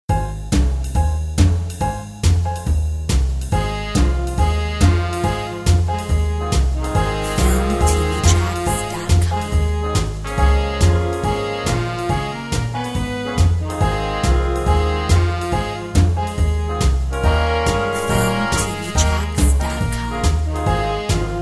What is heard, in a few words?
Moody swing